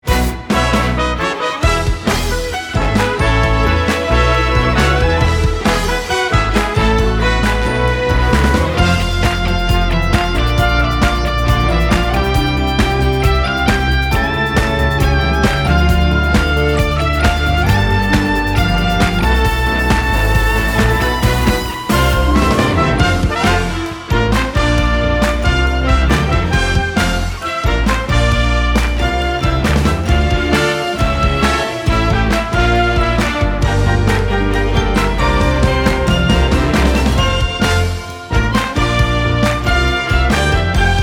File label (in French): Le Voyage Commence Version Orchestrale